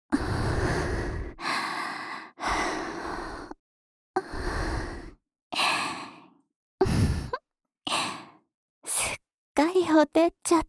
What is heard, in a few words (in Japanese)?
言葉責めをされながら耳舐め（右耳）; あなたを取り合い～幼馴染対決、ツンデレ娘と近所のOLお姉さん～; プレミアムフォーリー